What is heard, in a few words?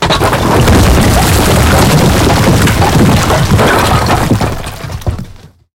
building fall big